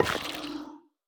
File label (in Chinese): 幽匿尖啸体放置声